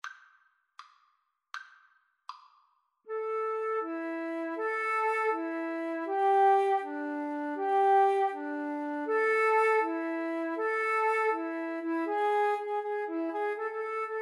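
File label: 2 flute karaoke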